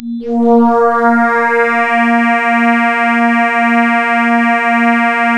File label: A#, Synclav FM; Big Fish Audio; SPACE1